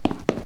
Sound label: steps platform